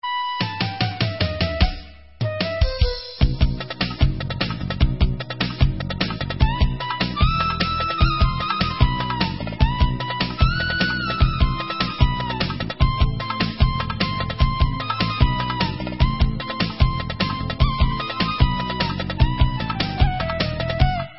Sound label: Instrumental